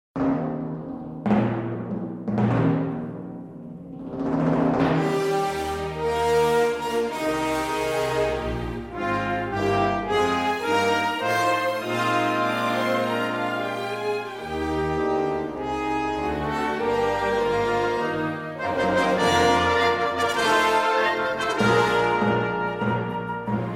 O Canada (Instrumental), English Chamber Orchestra